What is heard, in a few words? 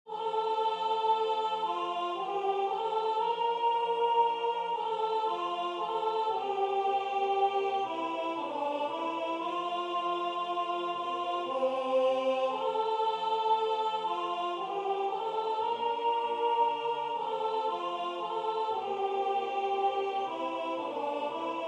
HokiHoki sopr; Potpourri 2025